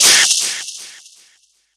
Demonic Viper Death; Kilta

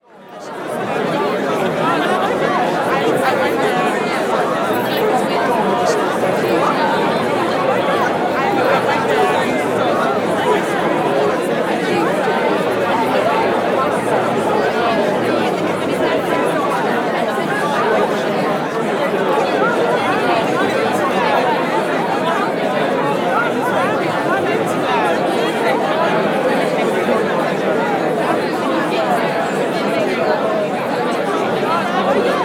Crowd Outdoor Busy Reception Party, Walla; Sound Ideas; Walla Series

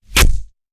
Stab Bayonnet; Sound Ideas; Guns

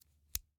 Scissors, snap, snip, shear, small, single; Eiravaein Works; Vaeyan IV

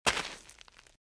Footsteps - Gravel - Walk - Right; RadwinIsland

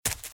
Footsteps Leaves Sprint Right; RadwinIsland

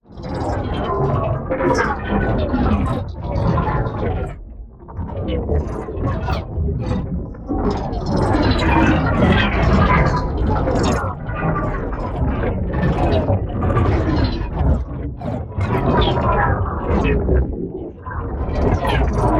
PARTICLES LARGE SLOW Heavy Processing; NEON; boom library

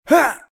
hurt; RadwinIsland

